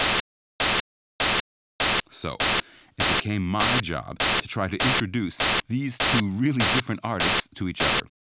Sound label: puls